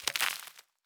Rock Small Debris; Survival Game Sounds - Lite Edition; Environment

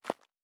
Dirt Run Surface, Footsteps; Survival Game Sounds - Lite Edition

